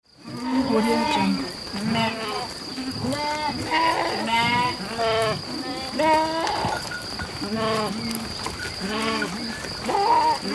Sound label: sheep